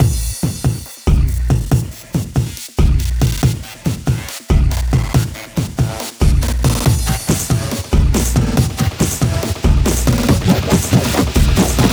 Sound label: 140BPM 8Bars, HandsUp & Trance Fills; Moffosaurus; Vengeance Samples